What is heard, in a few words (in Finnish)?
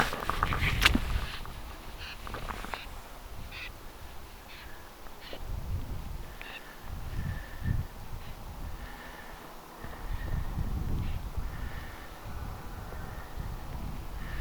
japaninkurpan aani